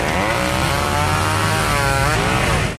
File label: Chainsaw Cut; friday the 13th killer puzzle